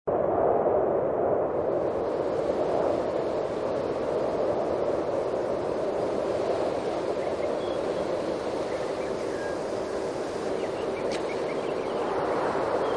Amb Woods Ext

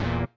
critical stop

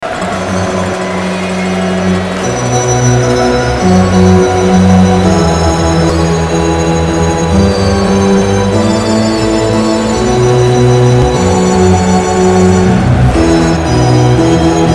ORGAN; OLYMPIC

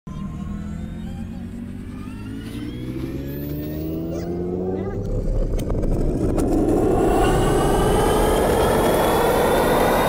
Camera Drop Into A Hole; Beneath Antarctica

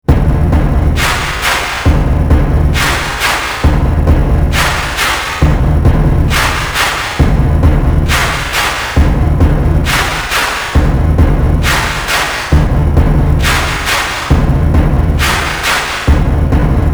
BumBum Tucs Tucs

